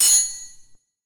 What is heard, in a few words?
swords